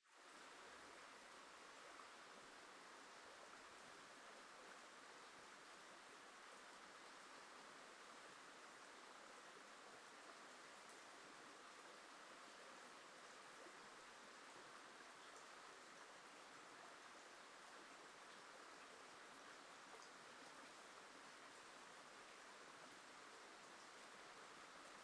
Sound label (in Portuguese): Ambiencia ao lado da Ponte na Estrada de Terra da Cachoeira; Ambiencia ao lado da Ponte na Estrada de Terra da Cachoeira Anjos e Arcanjos com ria